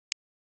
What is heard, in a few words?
Digital Click